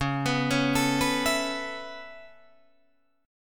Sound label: Db Minor 7th Sharp 5th Db down Guitar Drop D